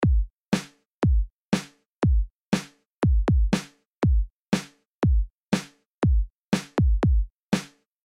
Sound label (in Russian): rhythm, Получается такой Ритм 2; Малый барабан ведёт ритмическую фигуру 1 во всех 4-х тактах